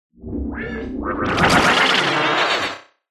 synth space weird synthy sci fi computer energy burst